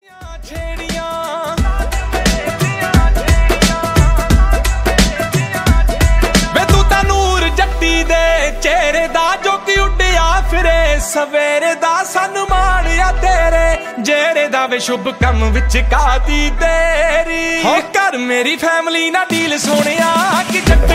Lyrical Video Song

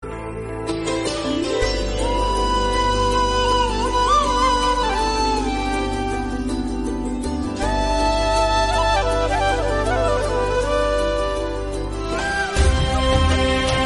Romantic Bgm; Jodha Akbar

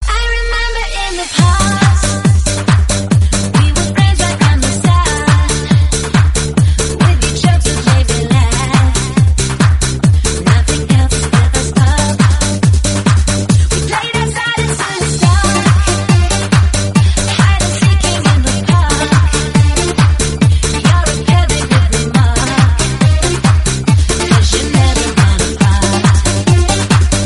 dance

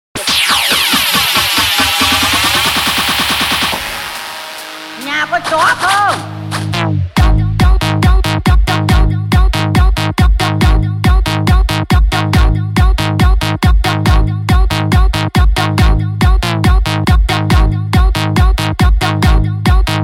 dance remix ringtone

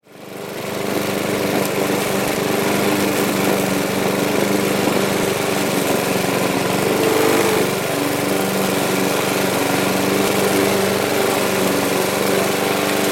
lawnmower sound effect

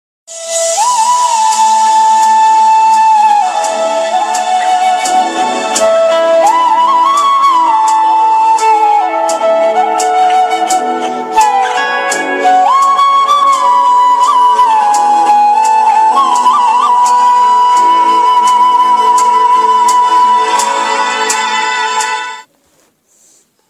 flute ringtones; bollywood

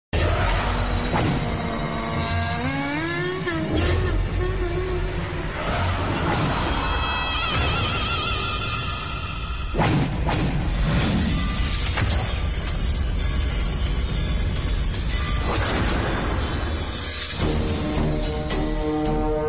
Horror Bgm; Kanchana

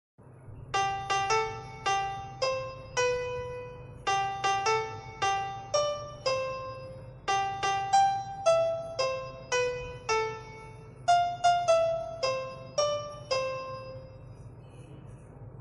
Happy birthday piano instrumental

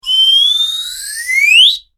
funny whistle

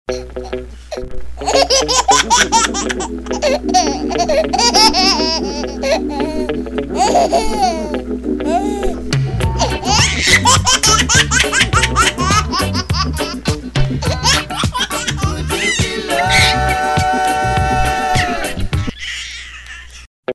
baby loughing the music

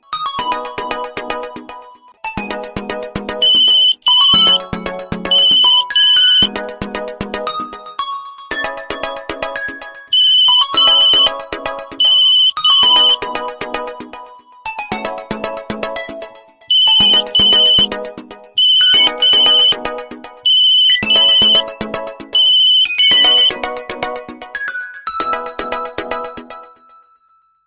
modern clock alert

